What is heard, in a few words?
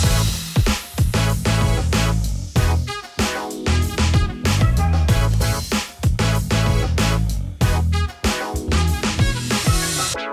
lobby